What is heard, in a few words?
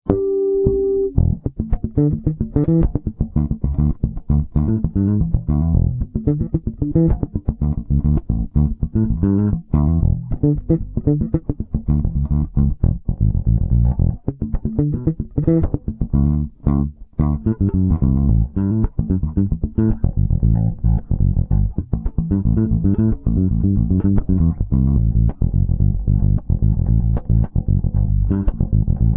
Guitar Electric Bass Funk Bass Groove; Instruments